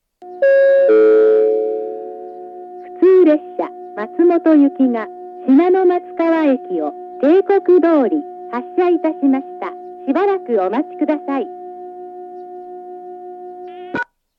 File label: up local; azumi-oiwake; matsumoto; jr-east